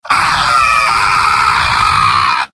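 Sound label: scout pain critical death; mvm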